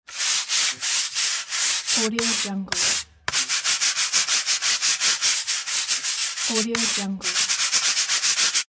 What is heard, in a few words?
brushing; nerso-77